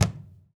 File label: CAJON; Univers Sons - Raricussions